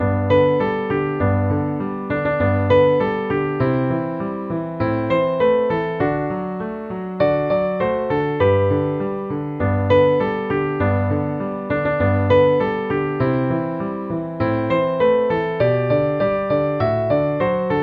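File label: Jingle Bells Acoustic Piano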